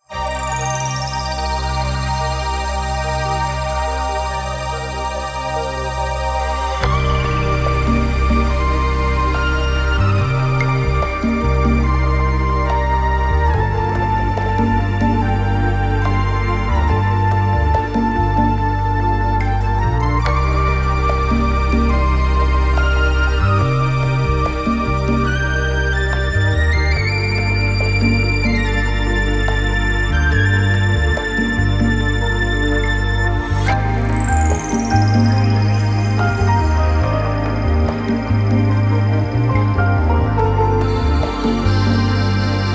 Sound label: Ambience of the Forest; Chrono Trigger, Donkey Kong Country